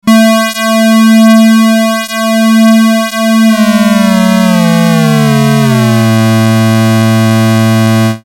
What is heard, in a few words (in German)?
schweben pulse